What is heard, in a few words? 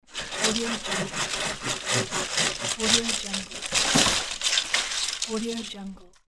sawing tree limb with hand saw; urban sounds